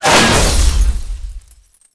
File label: van